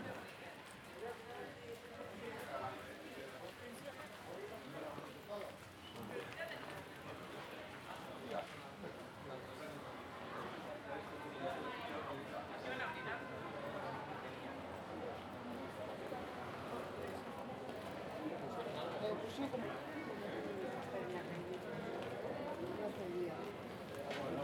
CROWD BUS STOP MID; SIMUZENSOUND

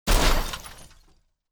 COLLISION HIT LITE; SIMUZENSOUND

